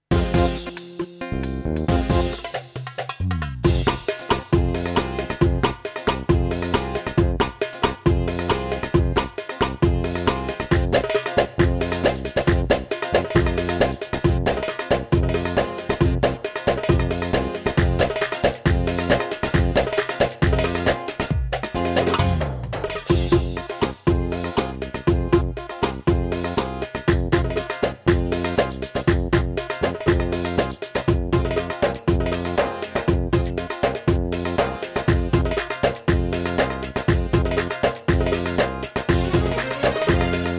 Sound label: Maksoum Baladi